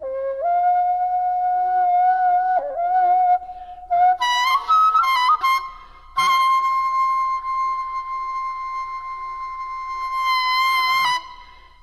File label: daegeum; Korea; chung sung gok